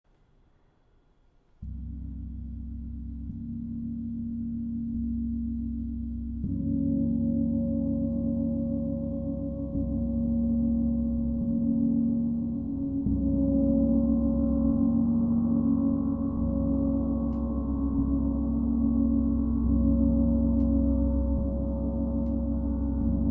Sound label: Drum Thunder